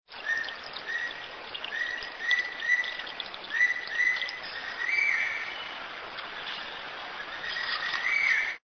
Spizaetus tyrannus

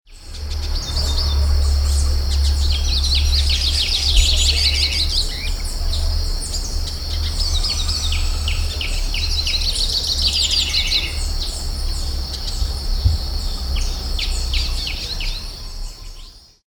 Spinus magellanicus Pintassilgo

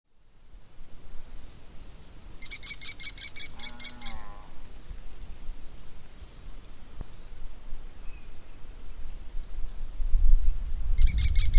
Falco sparverius Quiriquiri

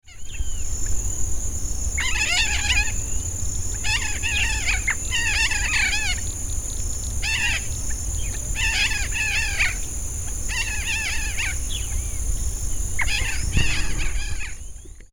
Primolius maracana Maracanã

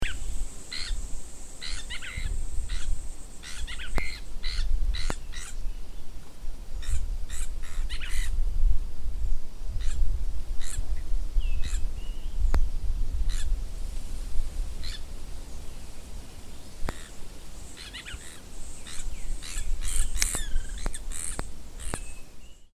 Myiarchus swainsoni Irré

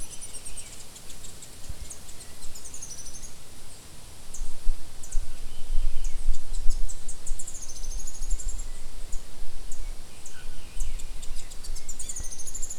Hemithruapis ruficollis Saíra ferrugem

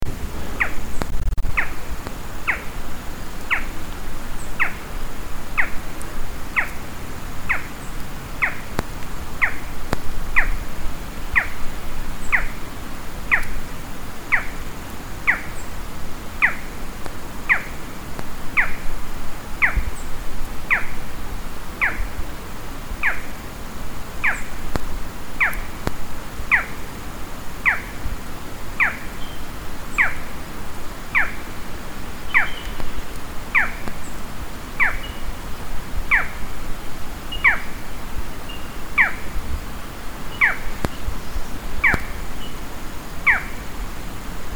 Micrastur ruficollis Falcão caburé

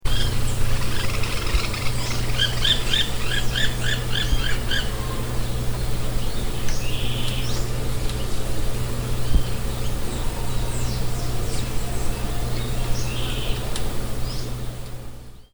Melanerpes candidus Pica pau branco

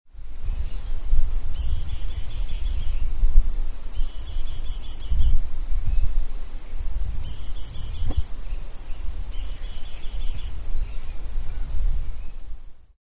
Leucochloris albicollis Beija flor de papo branco

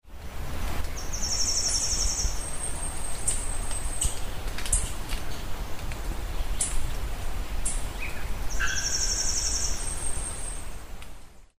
Sporophila falcirostris Cigarra

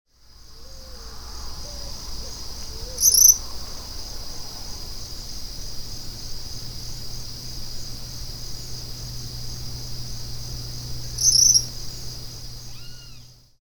Tyrannus melancholicus Suiriri

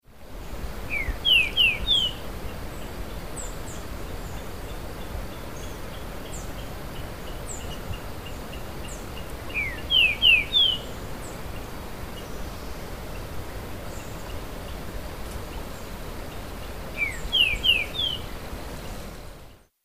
Saltator similis Trinca ferro

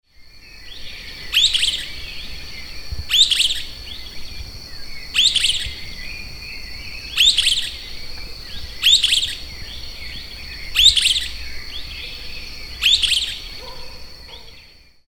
Myiodynastes maculatus Bem te vi rajado

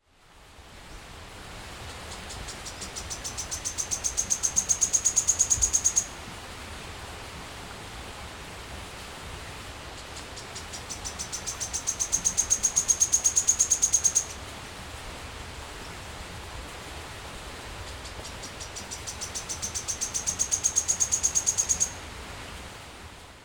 Lochmias nematura João porca

